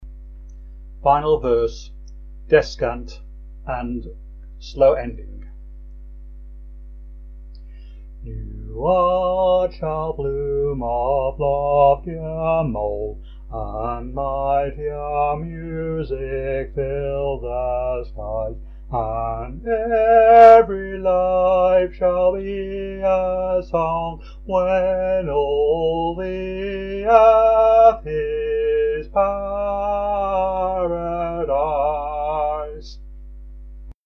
These Things Shall Be sung verse 5 descant slow ending, hymns